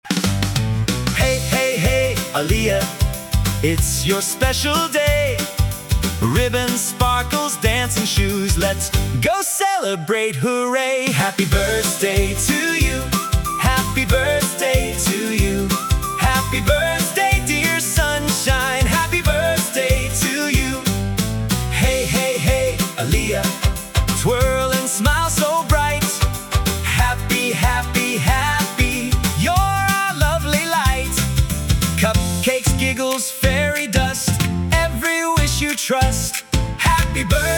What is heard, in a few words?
happy birthday tune